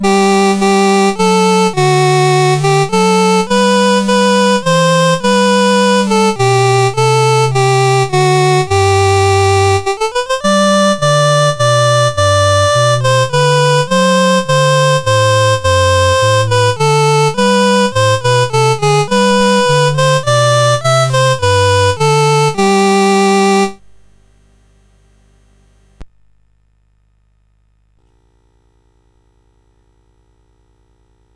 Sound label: English Anthem, CSG8580R5